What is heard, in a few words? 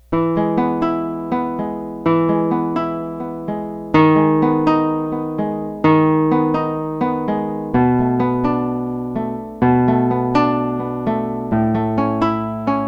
nylon string guitar